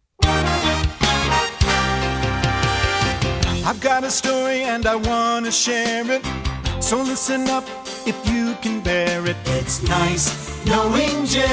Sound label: Jinglebells